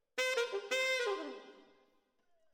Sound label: ORTF SCHOEPS, piano